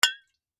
Bottle Full Tap, Bottle Full Tap Wine Beer Bottle; Foley; Tapping; Objects; Blastwave

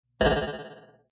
Squiggle; Squiggles

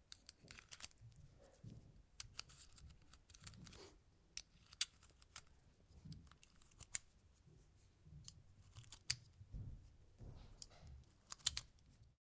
RIFLE CZ 452 VARMINT 22 CALIBRE MAGAZINE LOAD; A selection of domesttic firearms; Rifles; Guns

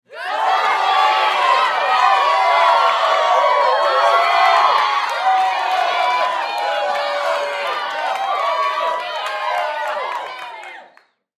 Human Crowd Studio; Crowd Reactions Scream Go Team Cheer Clap; Screaming; Blastwave; Humans

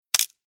Wood Bamboo Stick Break Snap; Materials; Tom Hutchings

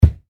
Impact Solid, Thud; Thuds (Sound Effects:Objects)

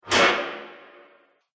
Sharp Metal Smack, impact; Miscellaneous Impact Sound Effects

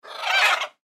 Bird Raven Squawk; Cluck; Birds; Animal; Blastwave

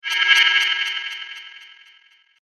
Ethereal Star Rays, science fiction; star wars / trek style games; Andrew Fleming

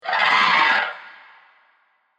Dinosaur Screech; Dinosaur Screech 2; Animals

